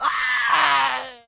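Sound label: Death Screams Male, battle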